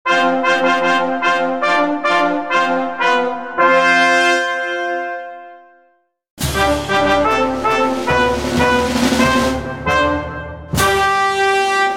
Fanfare Announce

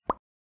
Like button sound effect

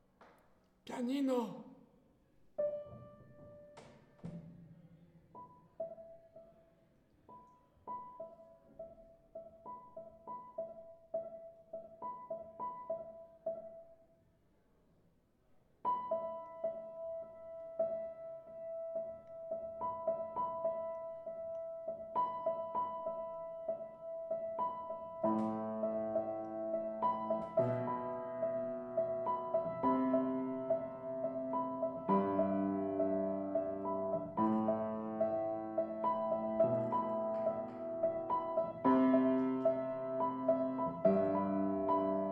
Piano Improvisation

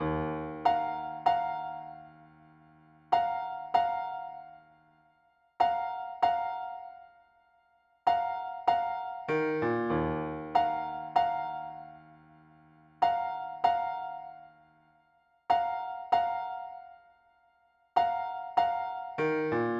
Hip Hop Piano Loop

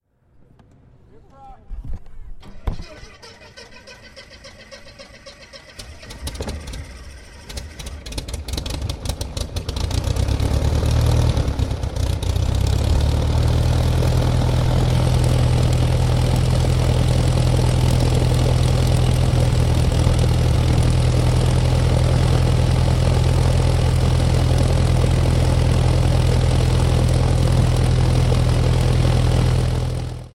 Small Plane Ignition

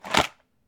Box Small Cardboard Shaken Top Off

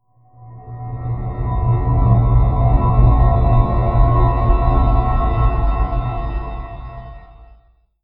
Synth Bell Transition

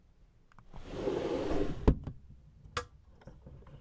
Sliding

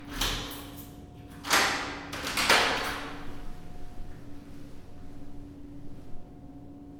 Door

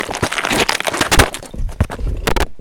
Rock Kicks Drag

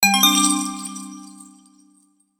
Game Gold Bonus

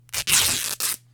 Textile Tearing

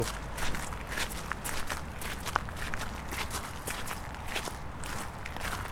Multiple People Walking On Gravel

